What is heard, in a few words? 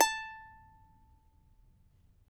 CHARANGO A 4; Spectrasonics; Hans Zimmer Guitars VOL-2